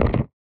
Percussive Hit, contact mic

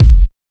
American Bass, Kick Drums; Zero-G; Soundclash

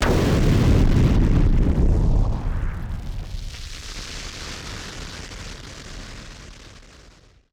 Party Popper FX

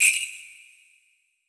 Jinglebell, percussion reverb; MARKYDRUMS

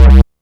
Synth Hit C

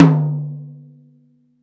TOM, Drum Dry; East Collexion; Drum 1

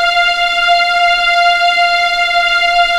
F5 EXP MF, VIOLINS; East West; The Ultimate String Collection

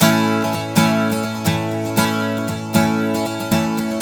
Blues Guitar Samples; ILIO; Fretworks

